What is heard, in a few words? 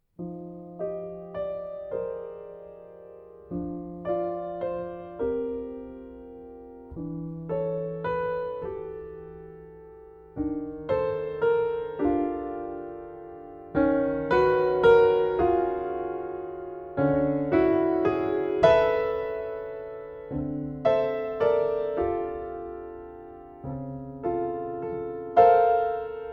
Soprano Saxophone and Piano; Suite for Soprano Saxophone and Piano